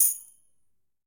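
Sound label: Tamborim A Shake; Latin Percussion; Drums; Drum Hits; Tambourin; Ableton; Factory Packs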